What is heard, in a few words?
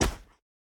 footstep normal; smite; vulcan